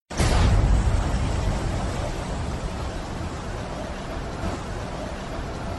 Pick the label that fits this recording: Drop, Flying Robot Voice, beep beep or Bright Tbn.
Drop